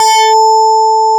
MARIMBA

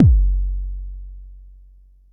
Bassdrum, Jomox Xbase09

